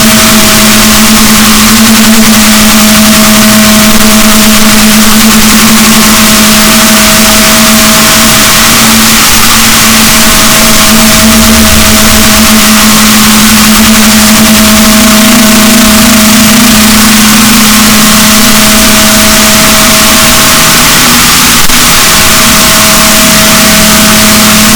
rorquals negatif, boat

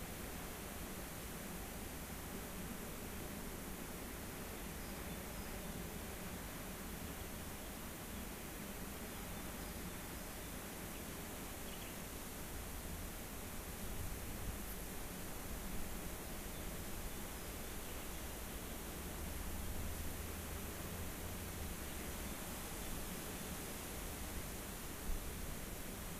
rayol farm; SEAMED

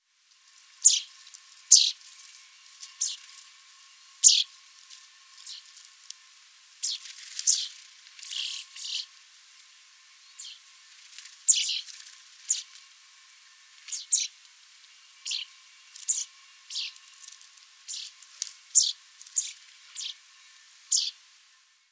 Fringilla coelebs; SETE

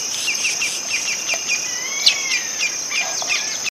bird

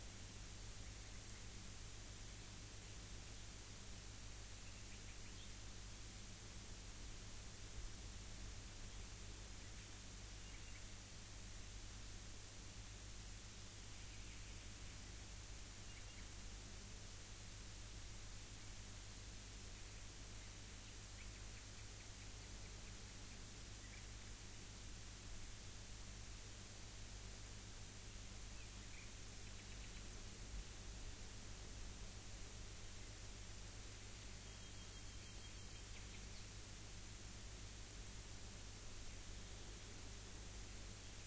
rayol farm; SEAMED

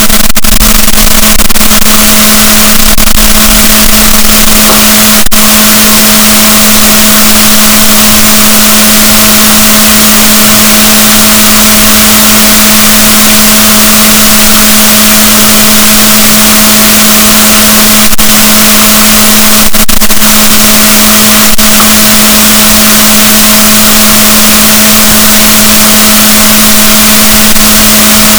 rorquals negatif, gaussian noise; Balaenoptera Physalus; SNR 0dB

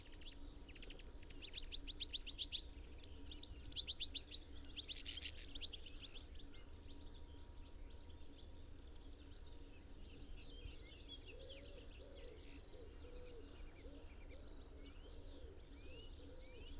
Carduelis carduelis; SETE

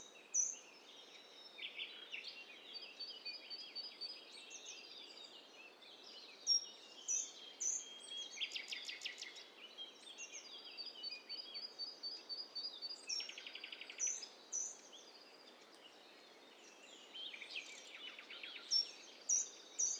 STEREO BIRD COCKTAIL PARTY

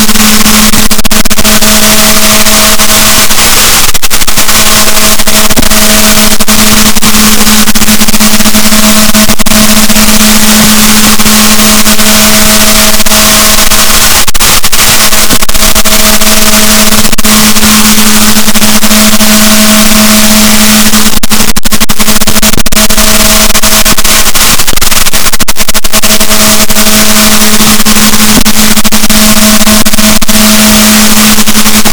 rorquals, Balaenoptera Physalus, boat

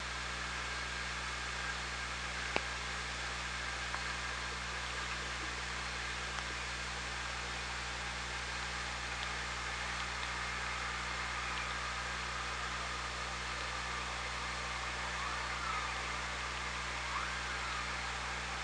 OrcaLab

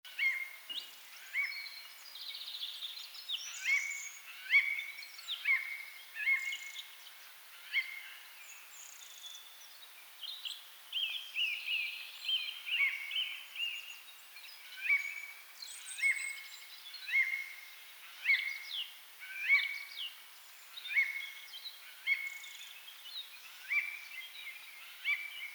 Garrulus glandarius; SETE

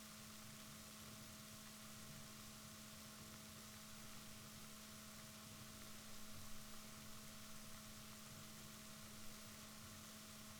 rorquals negatif, Balaenoptera Physalus Chile

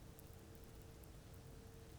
pulses, BALAENOPTERA; MONACO 2022-07

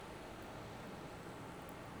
pulses, BALAENOPTERA; MONACO 2022-07

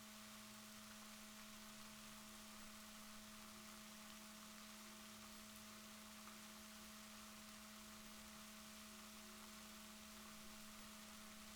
rorquals positif, Balaenoptera Physalus Chile

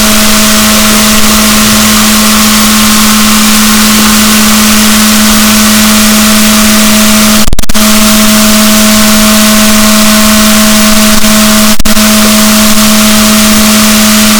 rorquals negatif, boat

